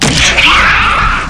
alien attack

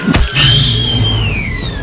raptor attack

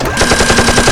gun; dogfightarcade

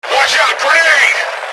fear grenade

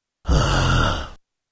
breath

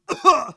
minor pain, soviet

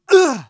minor pain, soviet

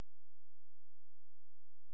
silence; droid

